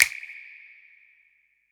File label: snap; eddyflux; dough-samples